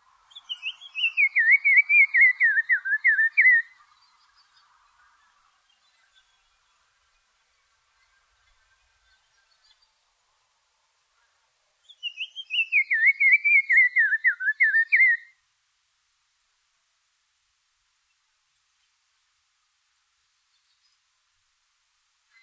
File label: black crowned tchagra